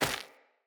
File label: Grunt Step Default, footsteps; Enemy; Corrupted Sproutfolk